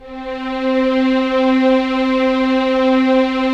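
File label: Strings Violins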